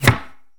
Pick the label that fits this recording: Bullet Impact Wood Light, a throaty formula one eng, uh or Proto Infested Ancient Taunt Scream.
Bullet Impact Wood Light